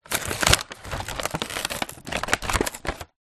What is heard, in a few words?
toy car box packet open; foley